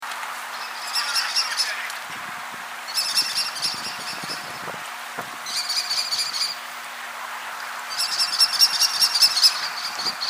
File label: kestrels